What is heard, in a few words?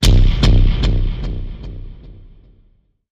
Hit Big Distorted Bass Delay